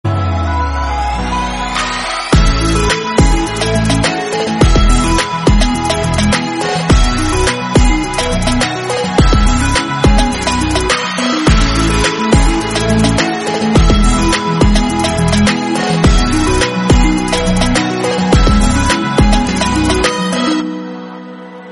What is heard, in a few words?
instrumental